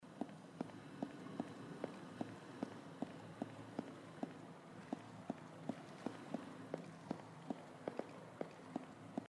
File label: steps; hyper light drifter